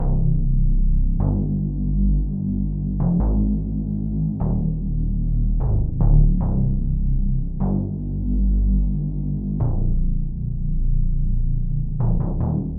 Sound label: Diesel Power Synth Bass